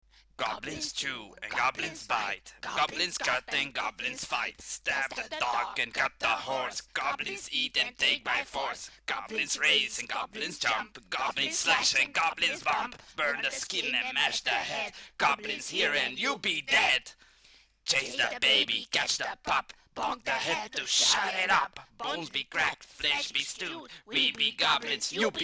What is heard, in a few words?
goblin song; runelords